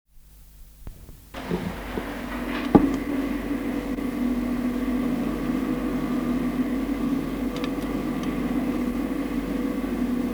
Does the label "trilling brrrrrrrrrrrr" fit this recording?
No